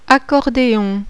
ACCORDEON, musique À VENT

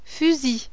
fusil arme; CHASSE

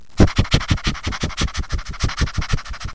renifler; 5 SENS; environnement

